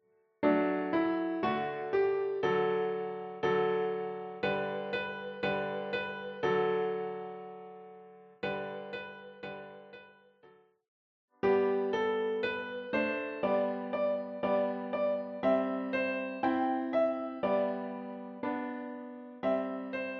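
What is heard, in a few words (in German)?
Kinderlieder, Klavier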